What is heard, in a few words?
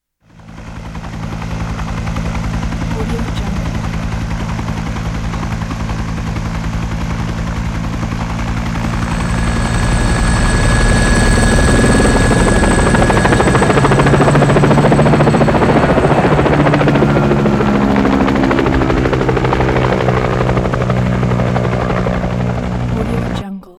bell huey helicopter